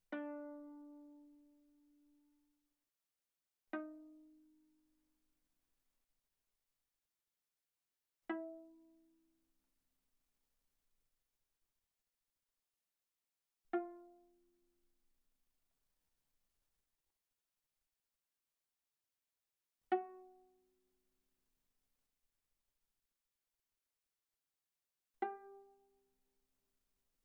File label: Viola pizz sul D ff D4 B4